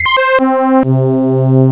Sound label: strings; Instruments